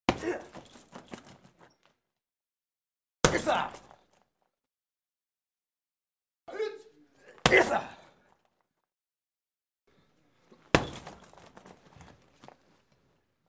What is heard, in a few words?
karate multiple side kicks